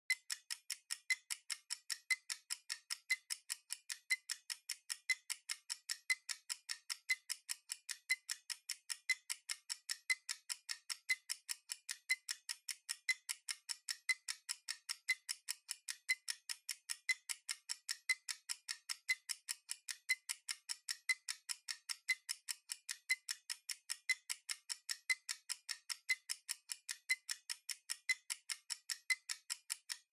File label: Timer Timbre 30 Seconds